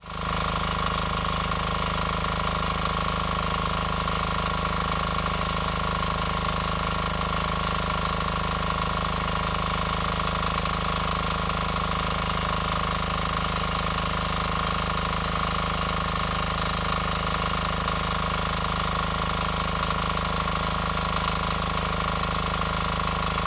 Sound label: generator